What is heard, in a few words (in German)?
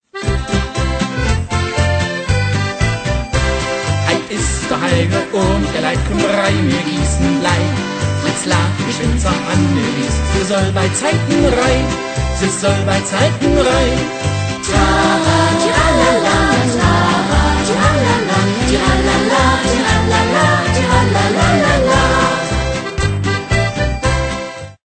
Heilig Obnd-Lied